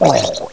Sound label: gurp; hunter